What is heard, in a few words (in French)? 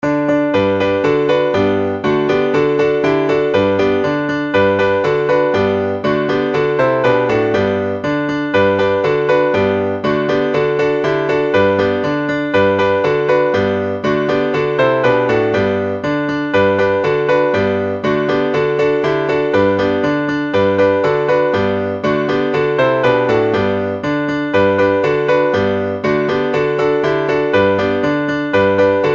savez vous planter les choux instrumental